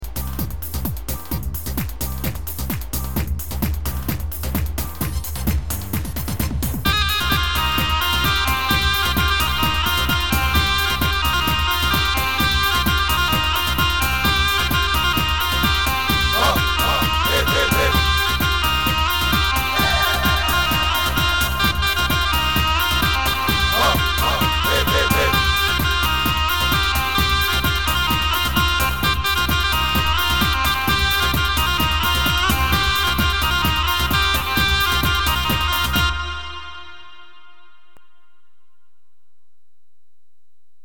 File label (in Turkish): halay; korg pa500 tr zurna halay